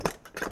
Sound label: Chair Hit